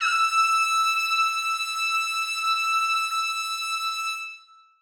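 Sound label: trumpets sustain e6; Sonatina Symphonic Orchestra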